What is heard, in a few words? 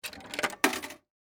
Arcade Quarter, Technology, Arcade, Game, Insert, Quarter, Into, Slot; BlastWave